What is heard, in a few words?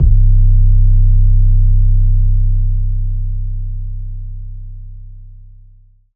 808 (W KICK); 808s